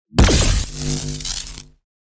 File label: Powerful energy blast shockwave